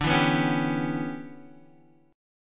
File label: E Minor 6th D, Em6/D chord; strummed; JGuitar